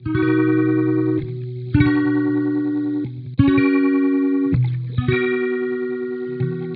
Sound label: rainfall, E♭, 71bpm; snowdon